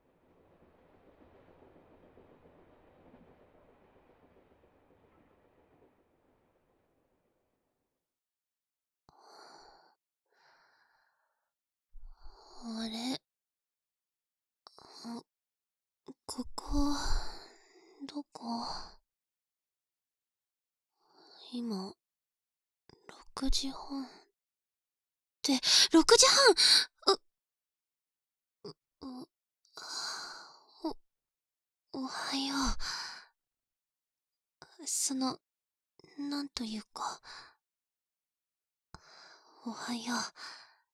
朝勃ちフェラチオ

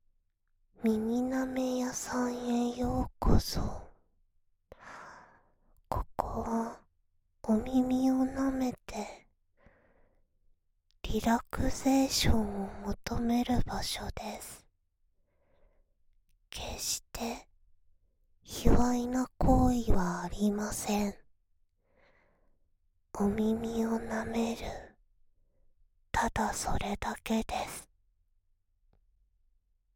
耳舐め屋さん, ASMR; リリィ

